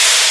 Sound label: Air High, Train; OpenBVE